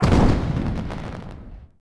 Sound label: Firecracker Explode; Sid Meier's Civilization 4 Complete; Warlords; Units